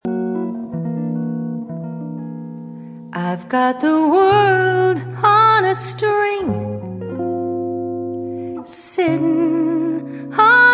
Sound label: String